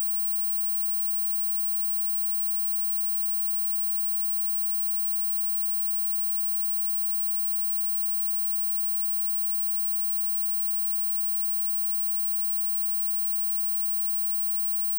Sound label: 20kHz; Telefony ciche jak komar